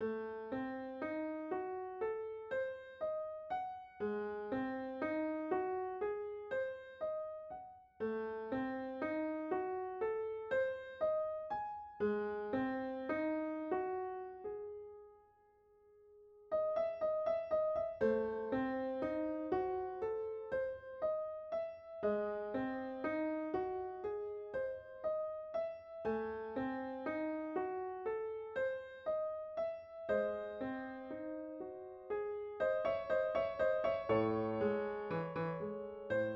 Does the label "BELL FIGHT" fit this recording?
No